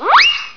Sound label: Cartoon Sound; PC; MARIOSFUNDAMENTALS